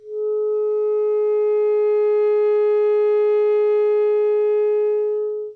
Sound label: A sharp 4 alt, clarinet